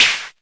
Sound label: Arturia MiniBrute sn